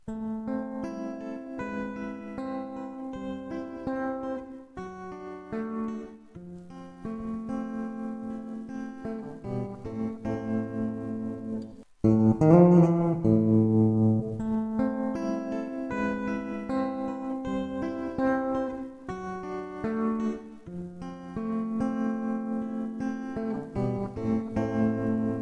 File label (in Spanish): tremolo, guitarra